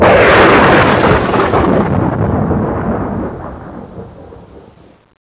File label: thunder